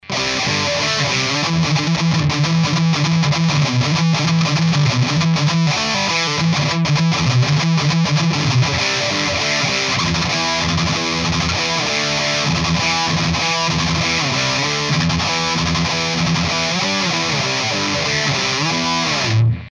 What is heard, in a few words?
Distortion Bridge Humbucker Bite